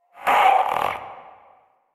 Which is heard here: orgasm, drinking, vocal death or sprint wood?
vocal death